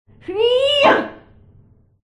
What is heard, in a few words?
human female diver attack voice middle